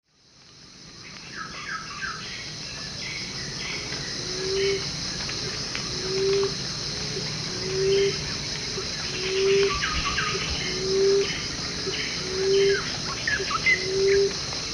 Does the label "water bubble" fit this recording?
No